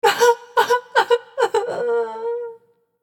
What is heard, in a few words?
horror voice female crying